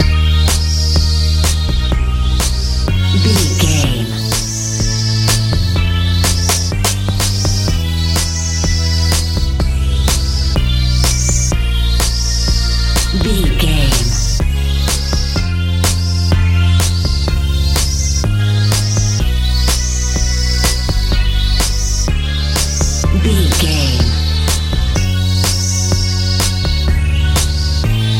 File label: house